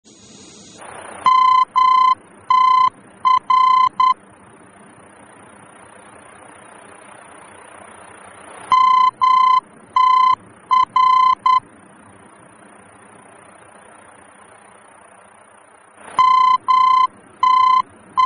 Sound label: VOR, aerobeacons; germany